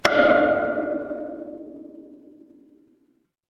instrument wood block

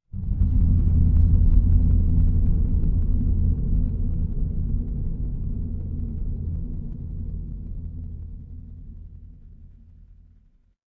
horror drone

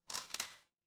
glass crack

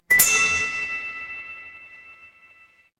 instrument chime bar

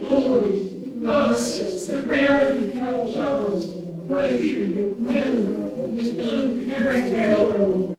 crowd murmur

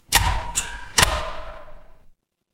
door open

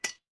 glass ceramic

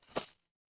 feedback celebration

